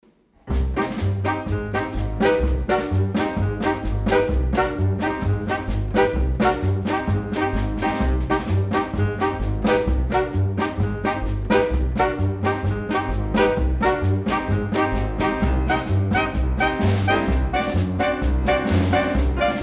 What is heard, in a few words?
Tango